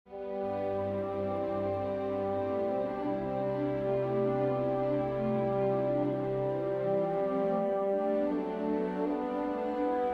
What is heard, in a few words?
prelude for bassoon strings; Johann Sebastian Bach; din sind die heilgen zehn gebot